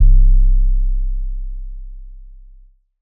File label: 808 Boom, trap